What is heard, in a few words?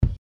Bass Drum Sound